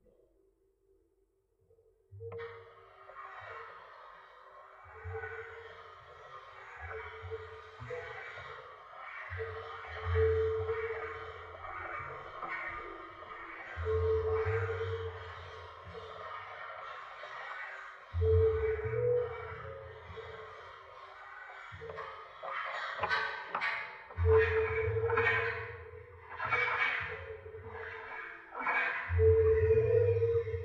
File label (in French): solo harpe et machine